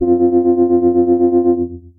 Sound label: Wurly, Synth Lead; Ableton; Creative Extensions; Poli